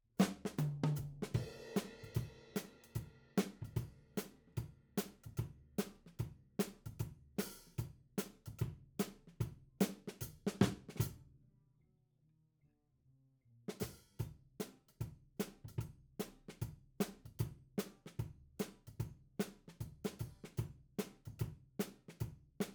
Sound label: Ragtime